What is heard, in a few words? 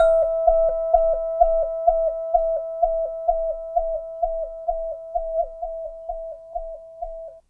LP Tone Bell, Asia; Sonic Foundry (Sony Creative Software); Continental Drift Aftershocks